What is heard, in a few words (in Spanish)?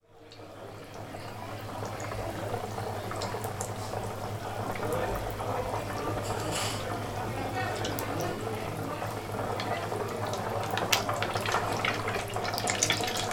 friendo croquetas; bilbao; txiriboga; soundfood